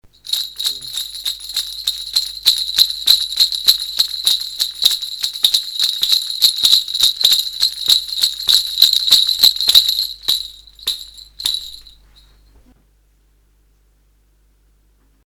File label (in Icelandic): bjöllur